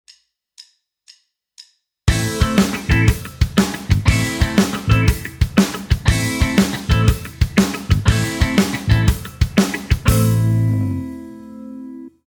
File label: backing; Guitar Techniques 2017 Issue 272 August